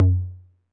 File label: Tom Low 808 Sat, Saturated